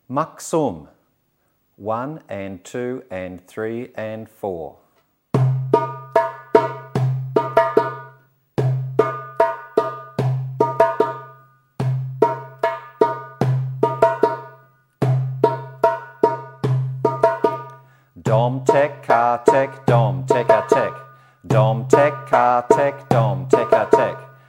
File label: Basic Maqsoum